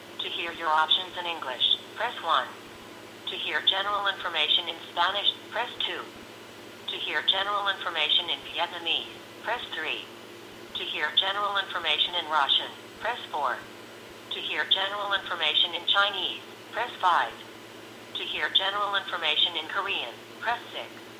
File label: Telephone